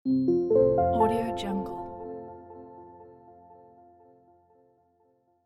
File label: logo